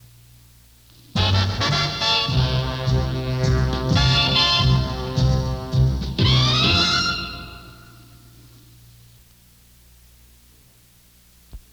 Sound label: fun sound music bed no voice; rutgers and douglass